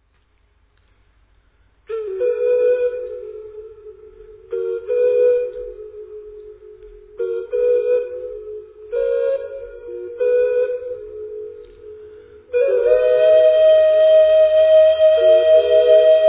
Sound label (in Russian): Okarina stradanie